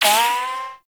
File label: Bullet Impact Ricochet; Weapons; Bullet Impacts; Warframe